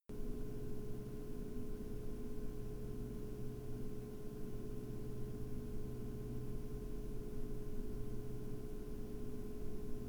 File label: 1000 RPM